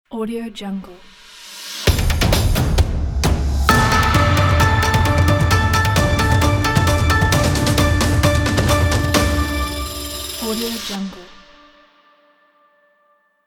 chinese new year intro logo

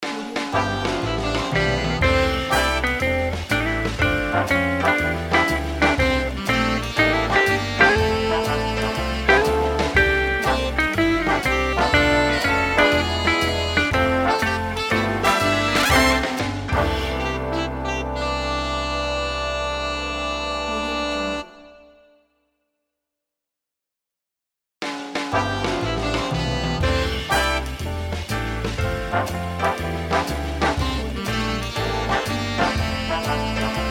big band auld lang syne logo

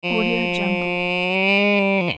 weird and humorous long animal call; sound ideas

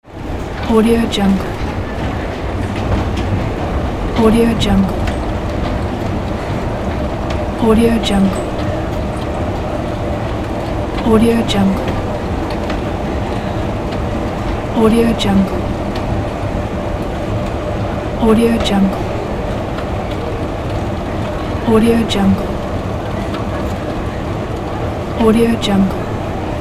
escalator

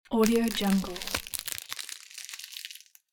plastic wrap

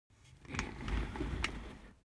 object chair office worn trundle soft

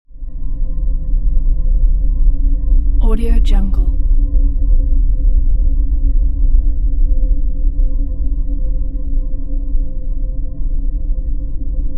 atmo drone very deep

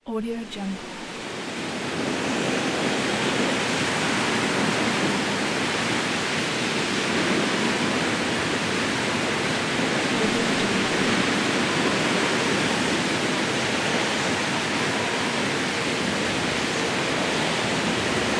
forest wind; fx library

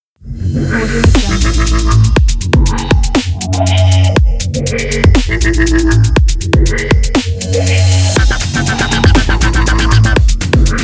dubstep intro logo

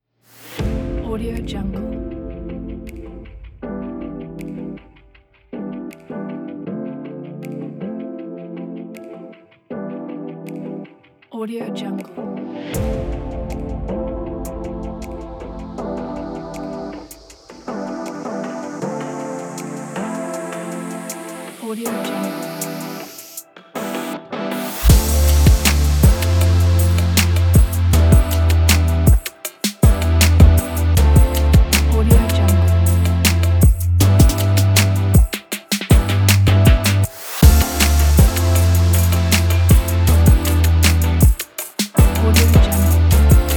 smooth soul music

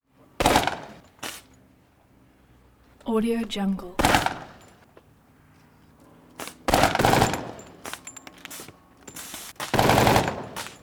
gunfire machine gunfire short burst

